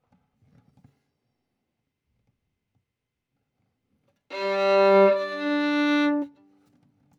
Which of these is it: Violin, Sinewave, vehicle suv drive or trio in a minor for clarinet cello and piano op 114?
Violin